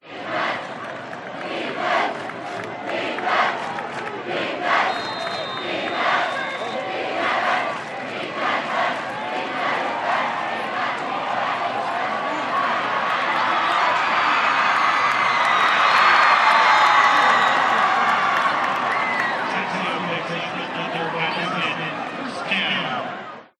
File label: american football crowd chant scream defense announcer tannoy